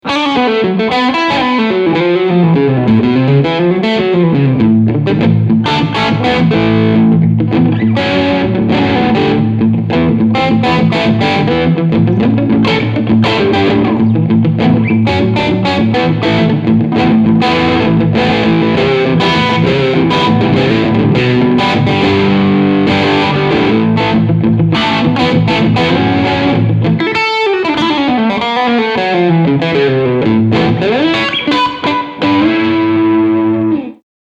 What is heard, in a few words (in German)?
Reverb Kurz Amp